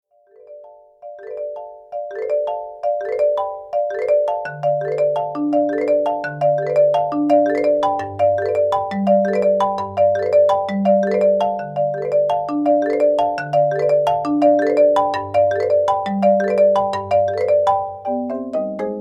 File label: solo